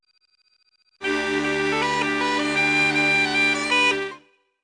bagpipe solo; wiley

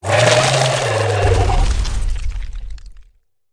knockdown final blow; superheroes2; pinchy

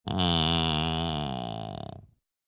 doggy tap; smithers

